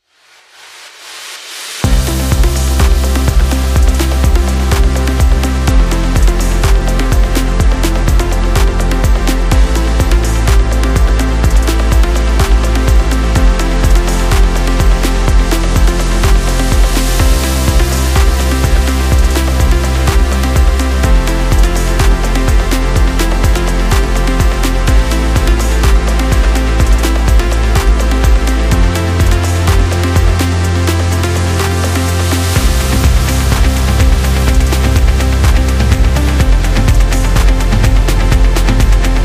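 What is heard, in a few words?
Patient Care, motivational corporate music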